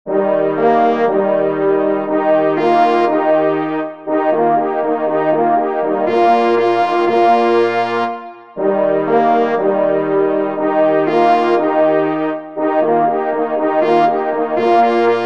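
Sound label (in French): multi cors, ensemble; Clocher de Dampierre; H. Teknia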